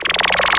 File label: WARBLE